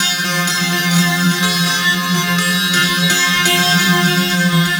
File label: Endless Guitar, Strings; Sonic Foundry (Sony Creative Software); Myths of Technology